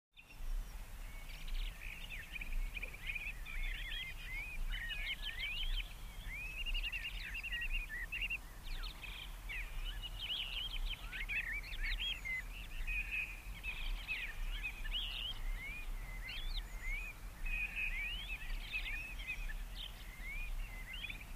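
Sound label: Early Evening Woodland, country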